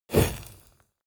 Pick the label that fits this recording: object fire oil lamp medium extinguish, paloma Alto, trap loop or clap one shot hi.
object fire oil lamp medium extinguish